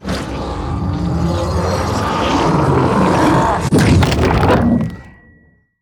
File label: sfx stun; wukong bdk